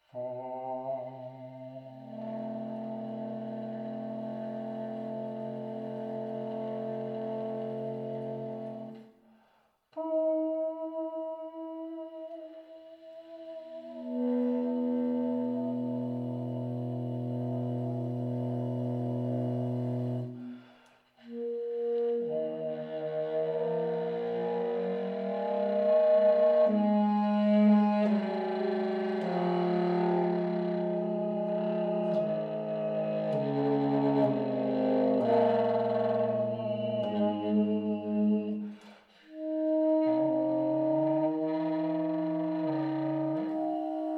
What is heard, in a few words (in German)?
sfx bar, saxophon spieltechniken; Slap